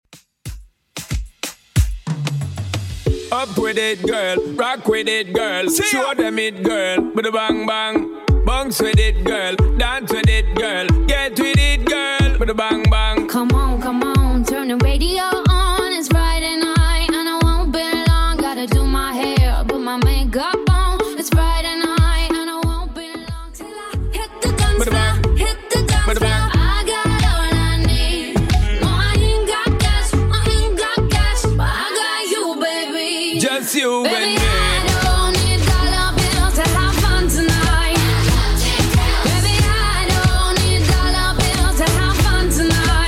2ks Redrum 92bpm

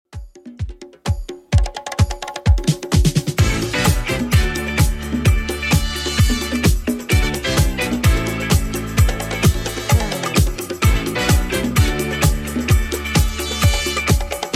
70s Redrum 129bpm

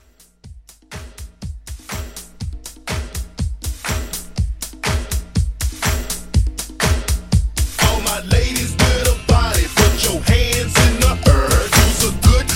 50s Quick Hype Re Drum

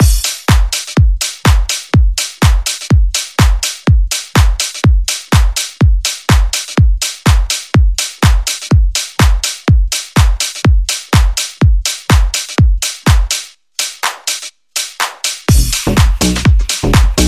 Pop Upbeat

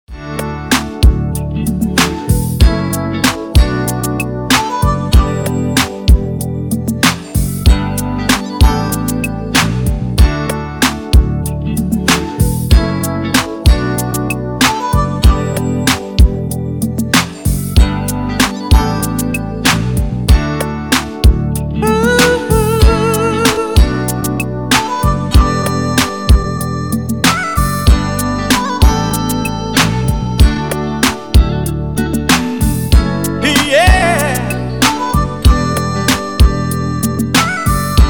Redrum

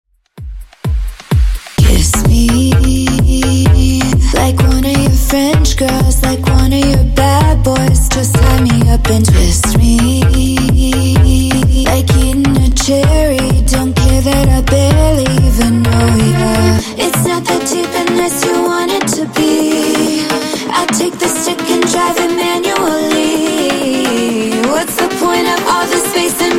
Dj Intro Outro Redrum 128Bpm

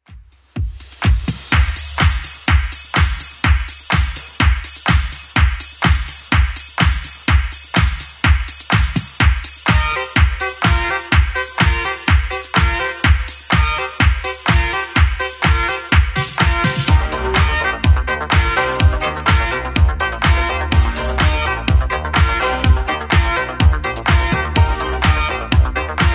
80s Club Edit 125bpm

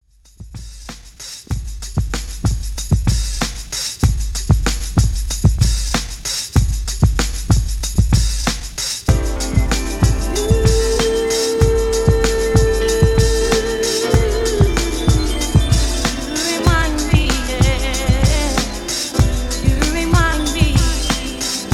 Classic Mix 95 Bpm Clean

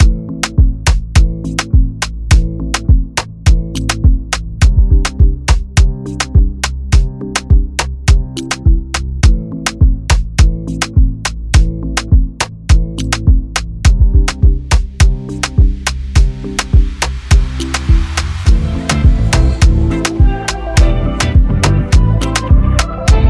Pop Reggae Redrum; Remixfreakz

